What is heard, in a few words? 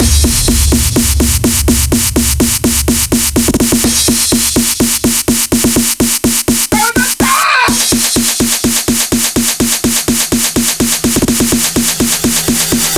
KICK; KICK AIGU 2